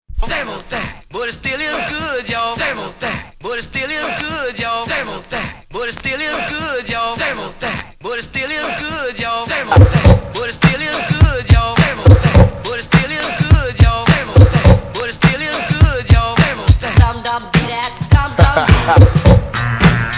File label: beats